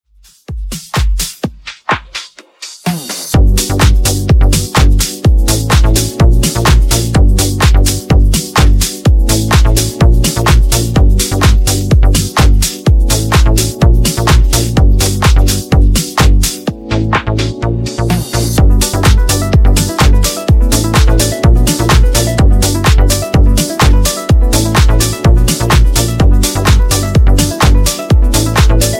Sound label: Funk 126Bpm